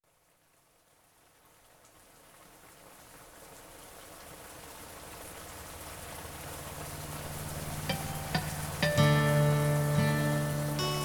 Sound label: Peaceful Rain